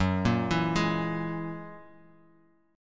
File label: Ab 7th Suspended 2nd Sharp 5th, chord; arpeggiated